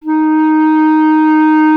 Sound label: clarinet D#, Solo Instruments; Miroslav Vitous - Solo Instruments VOL-1; 90 s Sample CDs